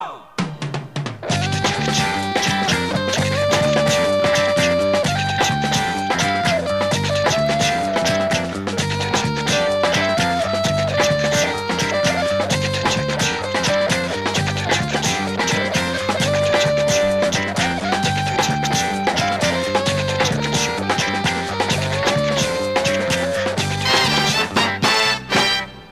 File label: Sweep, Radio style ads intros sweeps & jingles